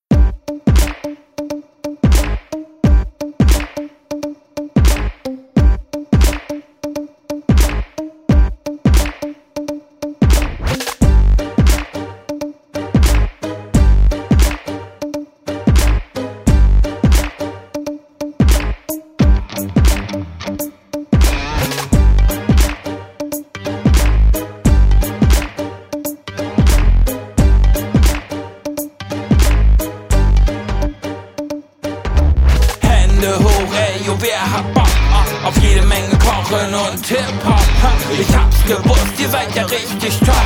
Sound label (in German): Begruessungsrap Beat und Refrain